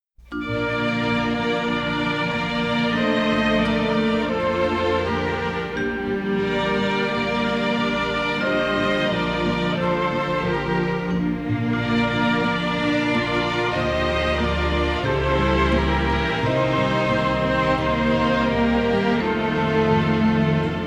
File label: String Instrumental